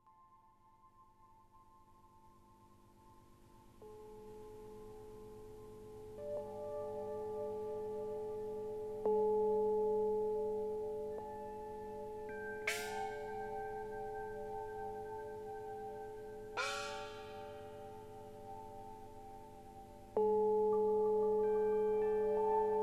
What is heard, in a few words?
Omnisphered Waves